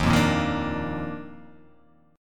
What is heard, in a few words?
D sharp Major 7th Suspended 2nd D sharp, chord; strummed; x,6,3,3,3,5,4,x; JGuitar